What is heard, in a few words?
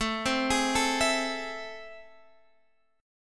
A Minor Major 7th, down, Guitar, Drop D